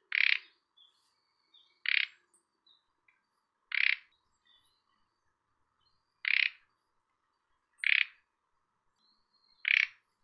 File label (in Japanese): akahige, bird